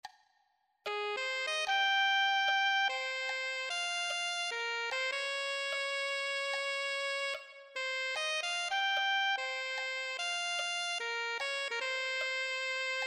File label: alto saxophone